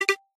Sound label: nokia focus ringtone